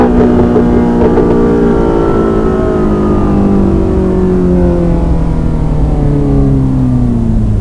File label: throttle off high speed, vehicles, ferrari 488 gtb; autos v3